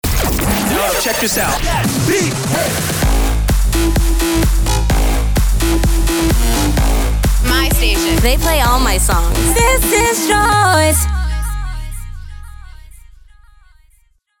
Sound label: Remix Jingle